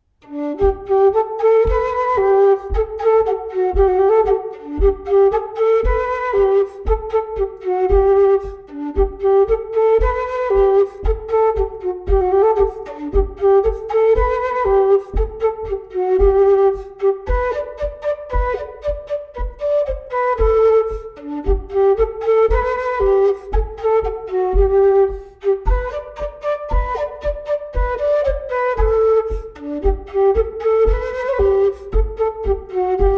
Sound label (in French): Bourrée; Recueil Lemaigre